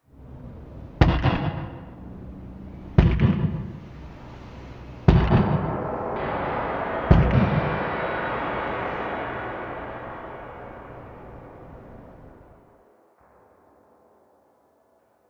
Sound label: stomp; cardboard kaiju